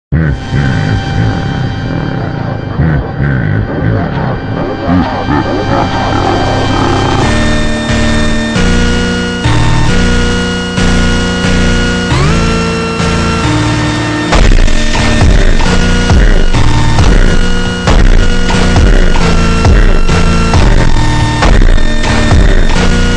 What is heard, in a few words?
ultra slowed reverb